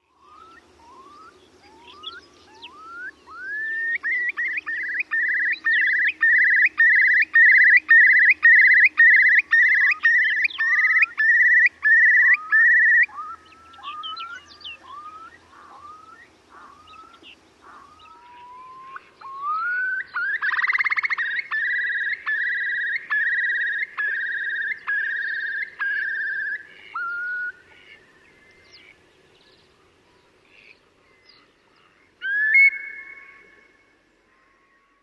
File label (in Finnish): Kuovi